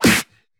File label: Snare; Vengeance Samples